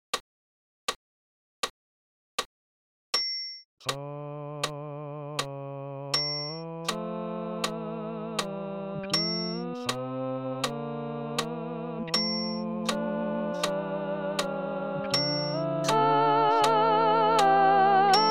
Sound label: Soprano; Missa Brevis Sanctus; Antonio Lotti